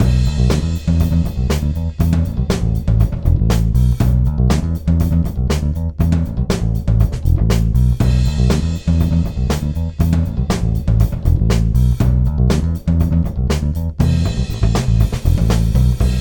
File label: Realistic Drums